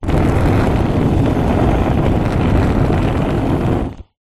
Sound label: household cooker oven hob gas flame blow movement wind; zapsplat